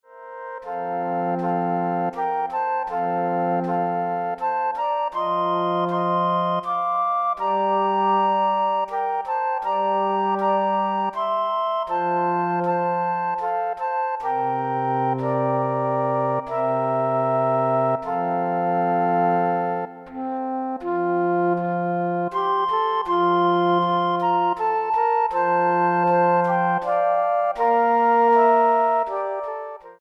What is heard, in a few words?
Flute Ensemble Away in a Manger